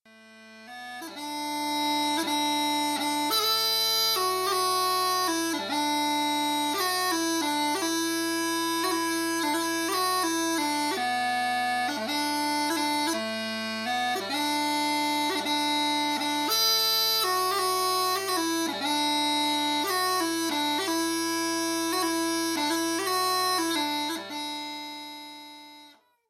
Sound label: Smallpipe Bord